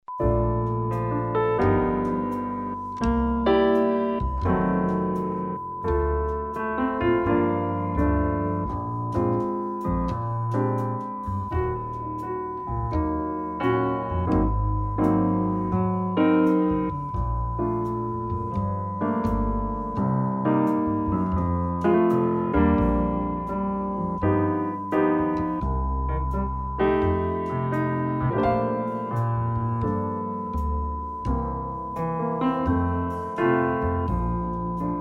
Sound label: cheerful time